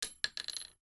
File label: cart